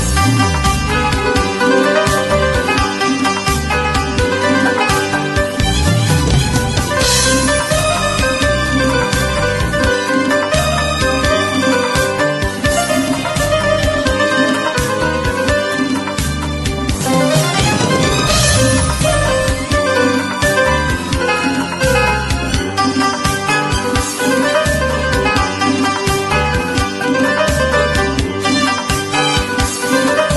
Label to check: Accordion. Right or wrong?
Right